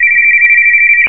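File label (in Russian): пискливый звук телефонного звонка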